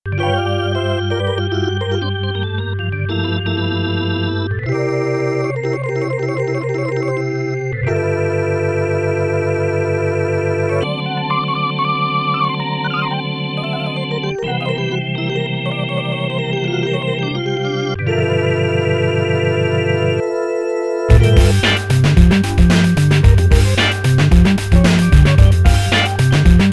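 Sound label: Deep Funk Organ, Korg Radias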